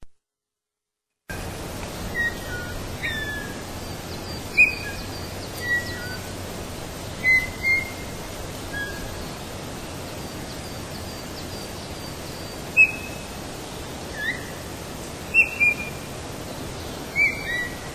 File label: bullfinch